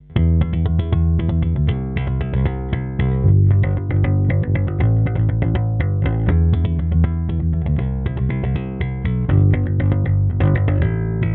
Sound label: Block 300B EQ Metal Cab